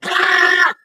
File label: ballbot yikes